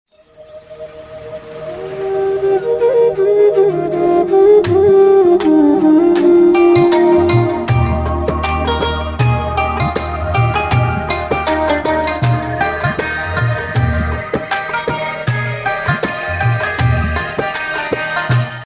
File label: islamic special ringtone